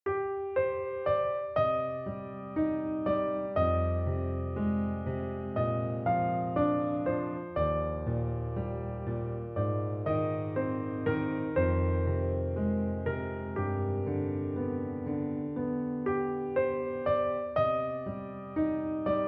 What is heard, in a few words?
piano